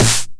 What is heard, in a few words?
909 SD Tape Sat